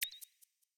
song ping; osu; SongSelect

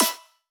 normal hit whistle; osu

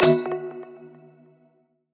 melodic; osu

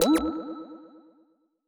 screen back; osu

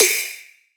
soft hit finish; osu

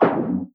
screen back; osu

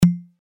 sliderbar; osu

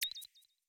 song ping; osu; SongSelect

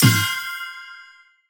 spinner bonus; osu

